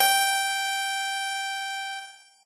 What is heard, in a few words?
piano